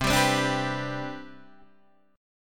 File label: C Major 7th Suspended 2nd, chord